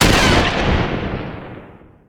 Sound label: Gun, Shot, Single Blast; gun and weapon sounds; captain audio; fully loaded